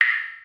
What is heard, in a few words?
drop, waterdrops; tortuga